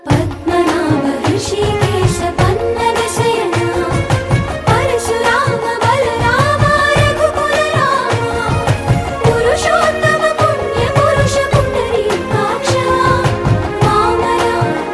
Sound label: Theme Telugu Ringtone